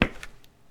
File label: Footsteps Concrete Asphalt Street